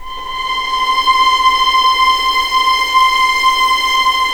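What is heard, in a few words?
legato violin, The Ultimate String Collection; East West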